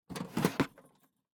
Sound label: Picking Up Metal Tackle Box Onto Wood Table; Badlands Sound